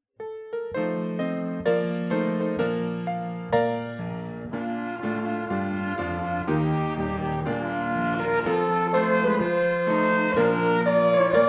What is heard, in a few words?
instrumental